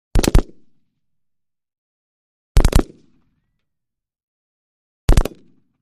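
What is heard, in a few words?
Mini 14 Automatic silenced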